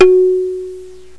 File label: gong